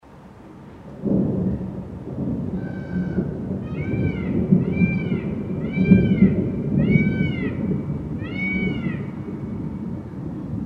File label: Soundscape; surrealistische kunst